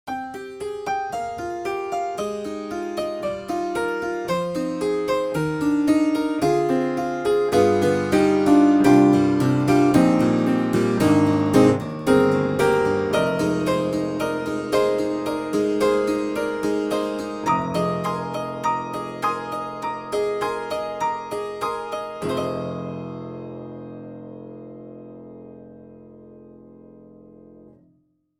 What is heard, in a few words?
Tack Piano; Spectrasonics; Keyscape; Wing